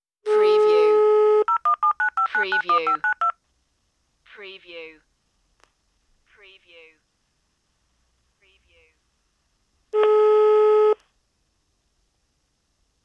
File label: tone dialing idle line